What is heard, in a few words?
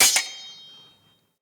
Dagger Impact Block; Weapon Impacts; Daggers; Combat